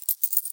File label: coin; whittler